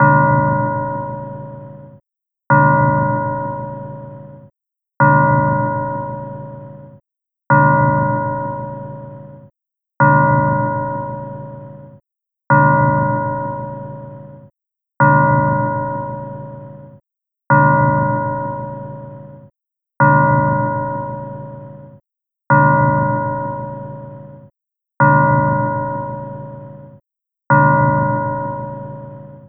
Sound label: Chimes; Arduino; Nixie clock